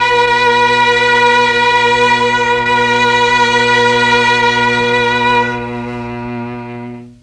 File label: MEL STR, Legendary M400; ILIO; Propeller Island Legendary M400